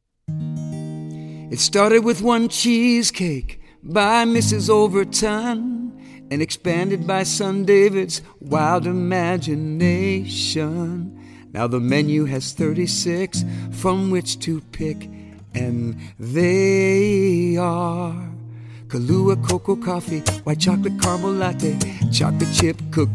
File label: jingle; Cheesecake Flavors